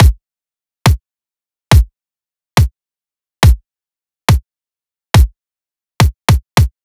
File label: Drum Loop 140 Kick; Loops; Ghosthack - Color Bass Essentials